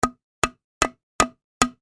Industry Hammer Nail Wood Multiple; Tools; Hammers

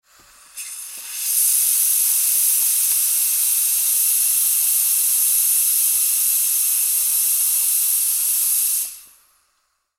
Espresso Steam, Espresso Steam Long; Industry Restaurant; Drink Mixing; Bars; Household; Blastwave

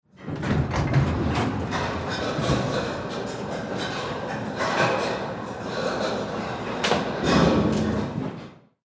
Industry Service Elevator Run; Elevators; Machines

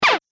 Android Laser Squeak, robot; Robot Sound Effects

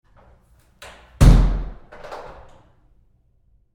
Door Bathroom Stall Close Roomy Med w Lock; Big Room Sound

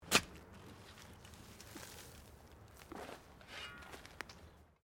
Digging Shovel Sand; Various digging tools and processes; Construction Sound Effects; Construction (Sound Effects:Backgrounds)

